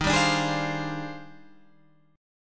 Eb Major 13th

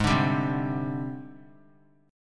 Ab Major 7th Flat 5th Ab, down; AbM7b5 strummed; JGuitar

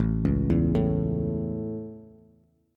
A Major, Bass; arpeggiated

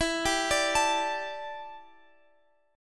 E Minor 11th guitar chord, Open F; Em11; arpeggiated; x,x,16,14,17,16

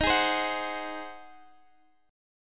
Ab 7th Eb

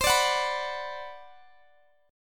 B Minor C; Bm/C; strummed

